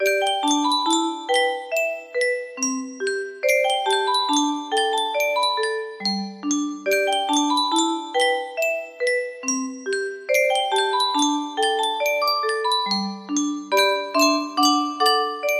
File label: loop, music box melody